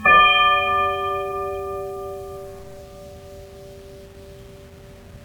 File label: Hall Flame Bell